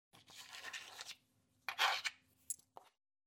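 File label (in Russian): picking up coins from a table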